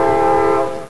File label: klaxon, Train; F40PH-2.6